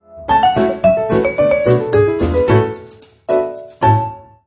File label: wipe music; curiousgeorge